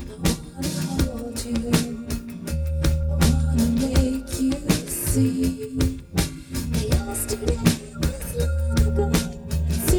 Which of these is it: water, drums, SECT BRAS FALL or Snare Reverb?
drums